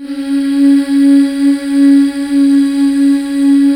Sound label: MMHH C#4; Best Service Gigapack 1